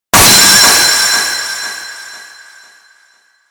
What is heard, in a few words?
SE lowless